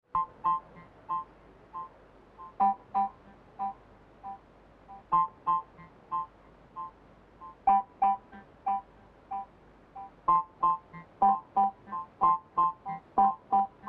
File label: piano interlude filter